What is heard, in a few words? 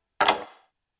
Menu Click